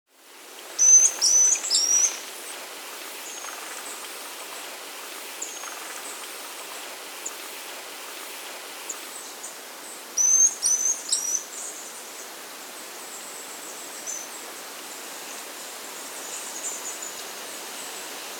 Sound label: White-Tailed Tyrannulet (Mecocerculu, S. America